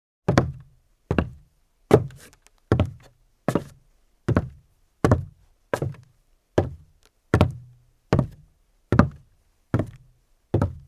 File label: footstep; BBC 55 - Footsteps 1